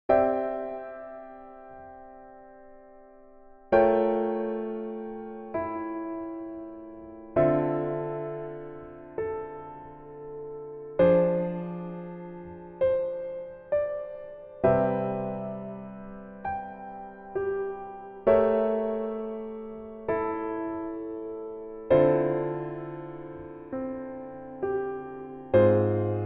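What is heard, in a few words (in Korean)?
Piano version 남자키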